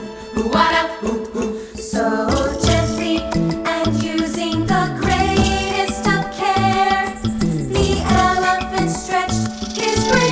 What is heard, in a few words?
Bird Girl callback vocal